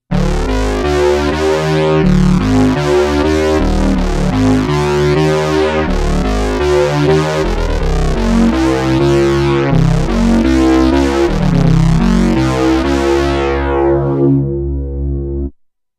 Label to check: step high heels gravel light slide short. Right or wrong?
Wrong